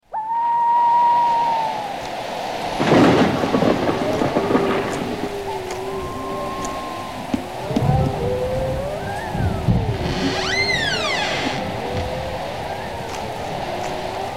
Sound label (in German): SFX Drache